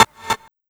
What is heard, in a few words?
rave pulse; Sonic Foundry (Sony Creative Software); Synchro Funk; One Shot Sonics